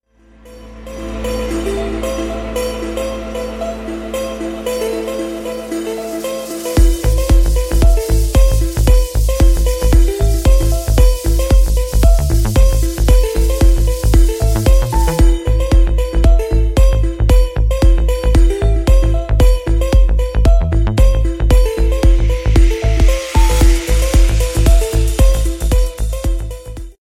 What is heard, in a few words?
Ring, ringtones